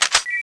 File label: draw, weapons; tfa cso